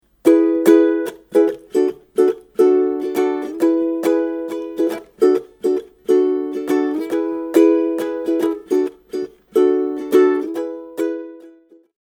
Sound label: Strum, ukulele